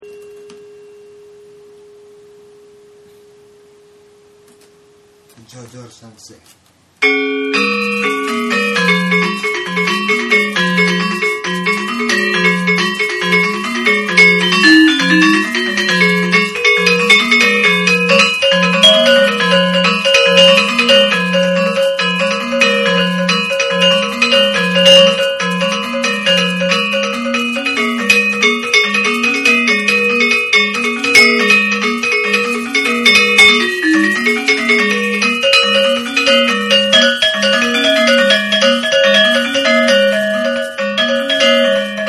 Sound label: wayang music kejojor, gamelan